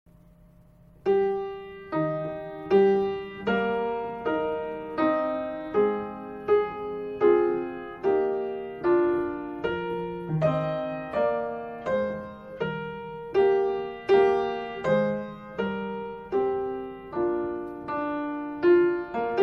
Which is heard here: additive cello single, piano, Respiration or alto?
piano